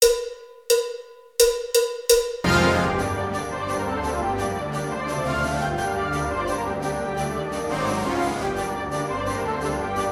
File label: Spanish Dance